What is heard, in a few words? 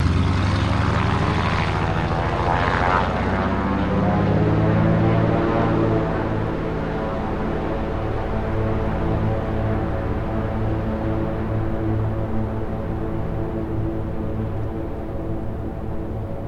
Prop Plane DHC, de Havilland Canada, Single Engine Rev For Take Off